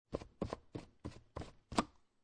Household, Laundry Detergent Bottle, Plastic Cap Screw Off